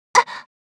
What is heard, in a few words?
hurt; GrandFantasia